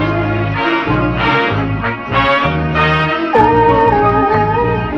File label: horns, Phonky loops